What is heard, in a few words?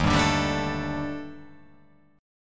D sharp Major 7th Flat 5th; chordshape